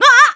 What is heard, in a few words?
Ledge Near; Toon Link; Link nearly walking off a ledge; Zelda